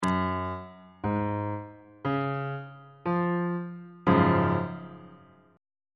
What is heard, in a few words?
f sharp major 7th suspended 2nd chord on bass clef